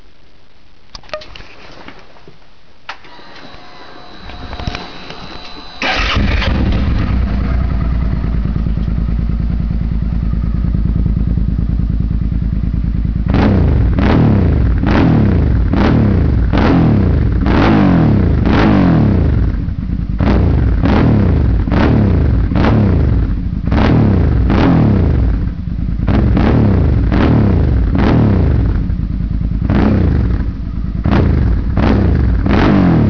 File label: v-strom, Auto-Moto; devil; chicane